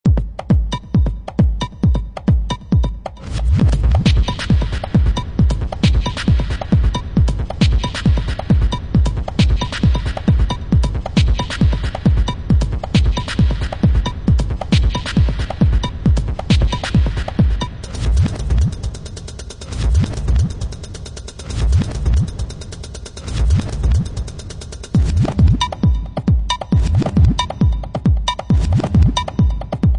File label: ant trance